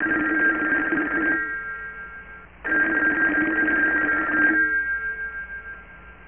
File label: RING; Johnny Ice: Digital Detective